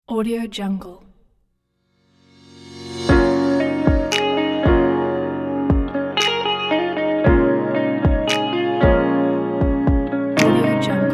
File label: rnb modern guitar emotional music